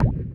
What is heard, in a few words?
gunshot; ASCIIpOrtal